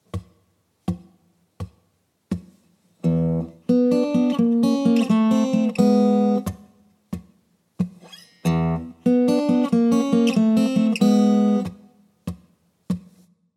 Blues Guitar Turnaround

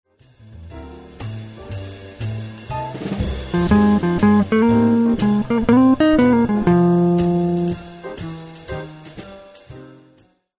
guitar jazz blues; wes montgomery guitar jazz blues lesson

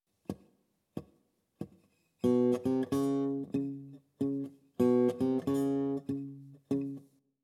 Open Tunings For Blues Guitar; An Easy Guide To Open Tunings For Blues Guitar

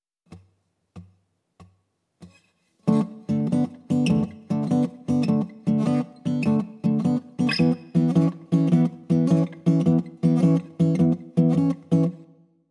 Open Tunings For Blues Rock Guitar; An Easy Guide To Open Tunings For Blues Rock Guitar

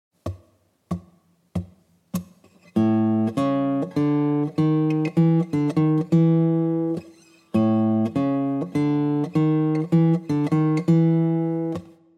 Blues Guitar Turnaround

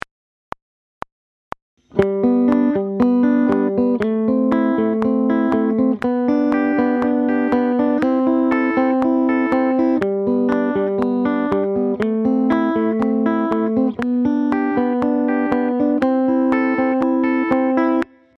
Hybrid Picking

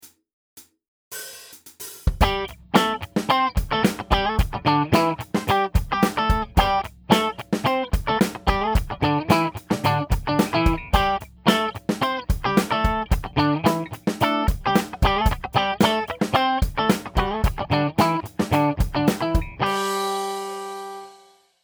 Funk Rhythm Guitar Pop Funk

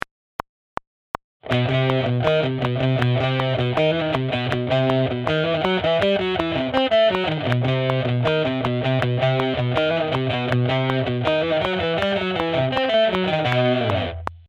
Metal Rhythm Guitar

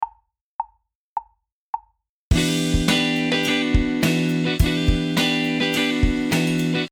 Minor 7ths

